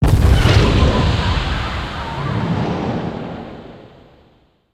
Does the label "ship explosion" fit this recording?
Yes